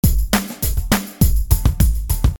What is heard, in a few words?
funk